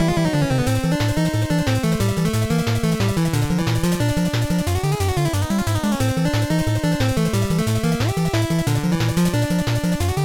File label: RPG Battle Theme Loopable